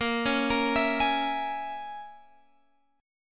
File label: A sharp Minor 7th Flat 5th A sharp, Guitar Open G